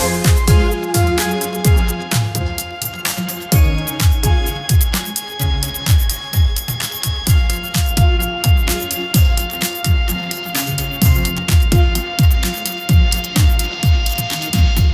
catwave dance party

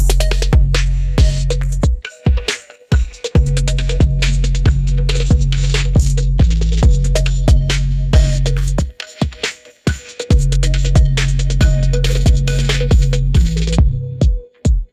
electronic dance music; ai

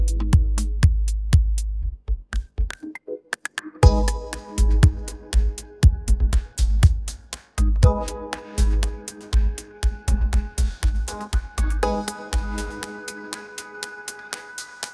upbeat pop melody, ringtones

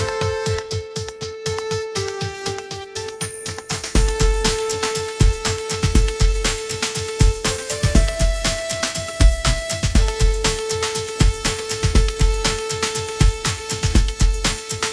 upbeat pop melody, ringtones

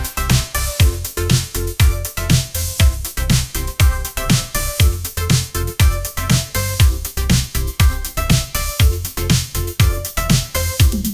upbeat pop melody; ai

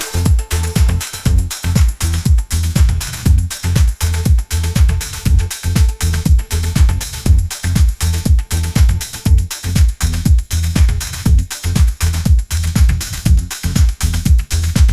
upbeat pop melody, ringtones

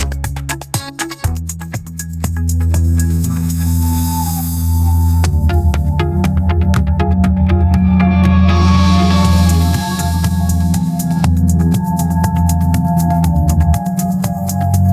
upbeat pop melody, ringtones

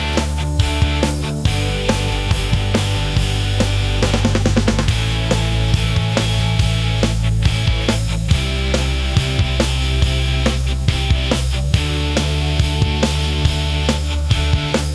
energetic rock; ai

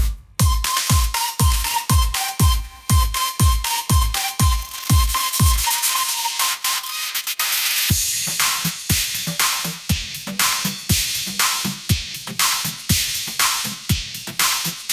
bright synth pop groove, ringtones

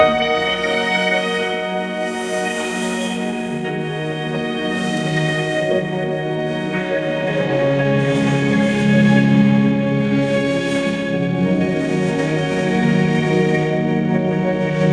orchestral strings, ringtones

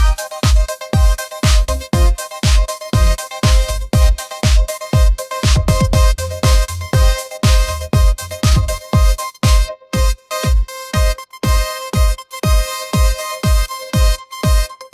upbeat pop melody, ringtones; ai

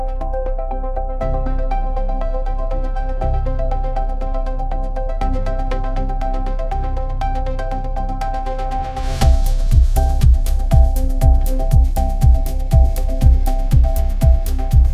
upbeat pop melody catchy hook; ai

